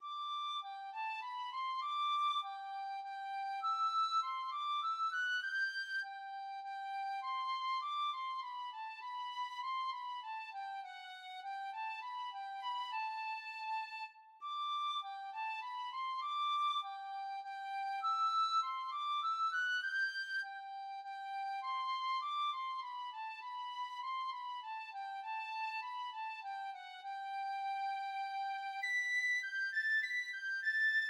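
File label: minuet in g, penny whistle, tin whistle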